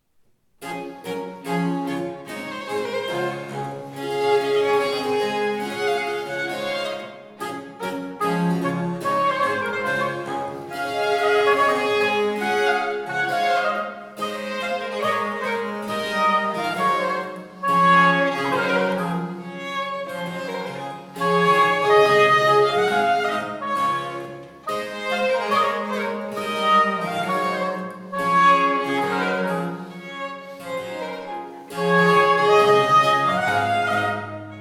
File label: Gavotte, Alte Musik; Rameau; Ensemble für Alte Musik